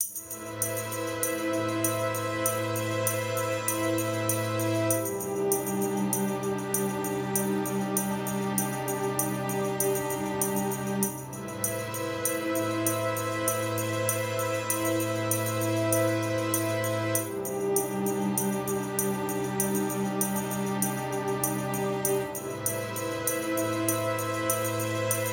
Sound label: Conversation Underscore, Dark Noir